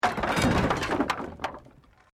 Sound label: Wood Collapse